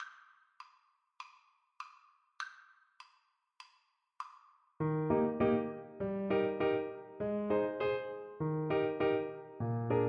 peasant, Trombone

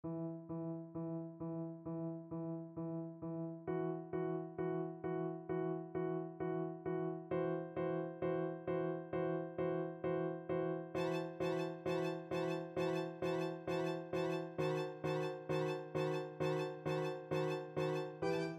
winter 1st violin

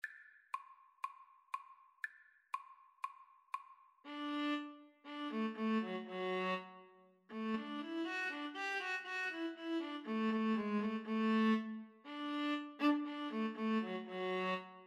viola viola

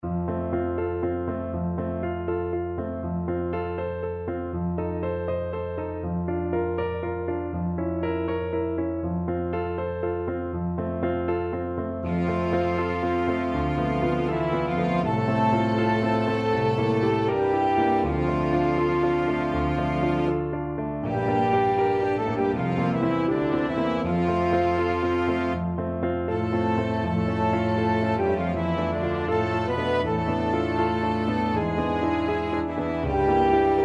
flexible unison

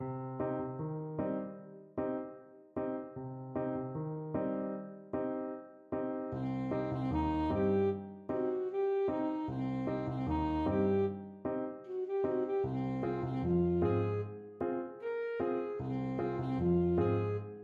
reggae, saxophone

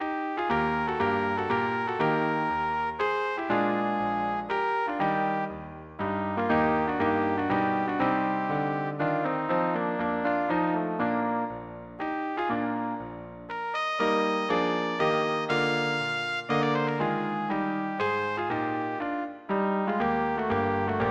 beethoven minuet g, trumpet

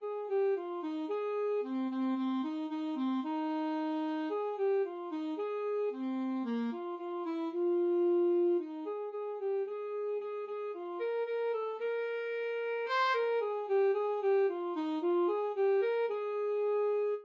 song of sixpence, saxophone

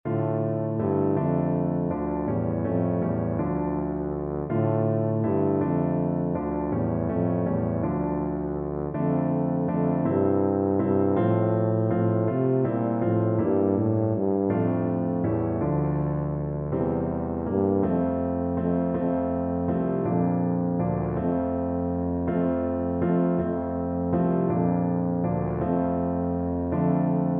we three kings, tuba

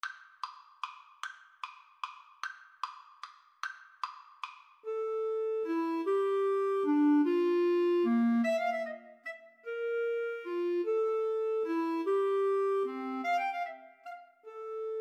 flower waltz, Clarinet Cello Duet

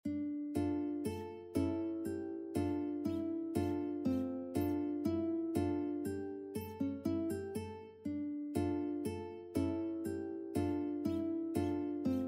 God Rest Ye Merry Gentlemen Uke

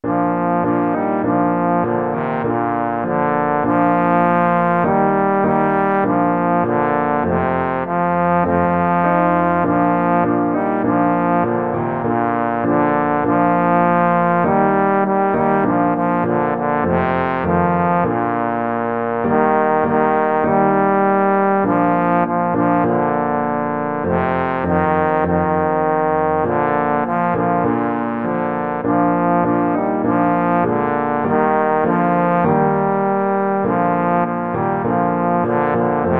trombone

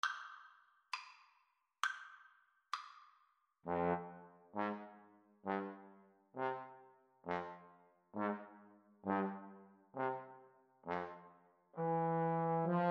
Trombone Duet

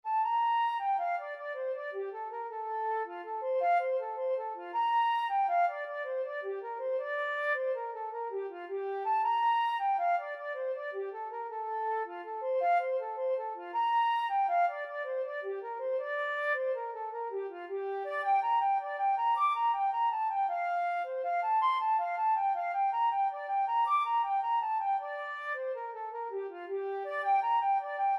flute

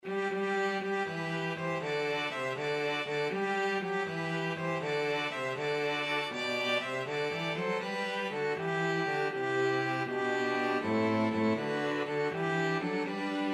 STRQ, string quartet

